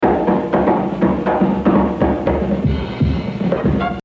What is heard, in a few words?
Jazz Drumming; Big Sid; The Evolution of Jazz Drumming (Gottlieb)